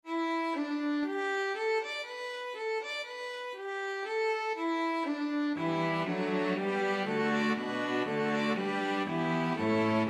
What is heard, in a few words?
string quartet